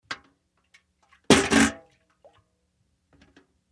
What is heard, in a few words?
Bucket Full Set Dwn; barrels buckets & cans